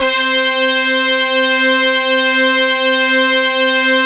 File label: PUNCHY BRASS; CMP - Analogon